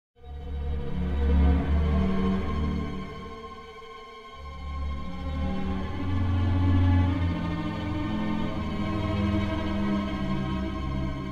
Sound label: trem strings - fear and danger